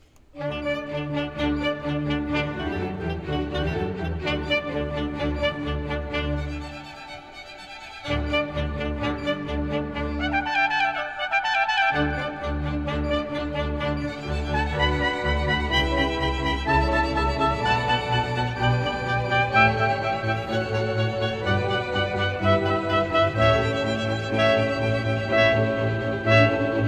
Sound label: Gloria, Choir, box organ; Gloria in excelsis Deo; Bytown Voices; 2025 spring concert